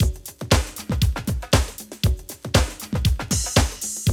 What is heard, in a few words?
Loop, Roland; Sound & Vision - Gigapack I